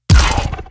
power punch

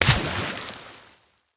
heal cannon

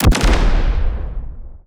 black cannon

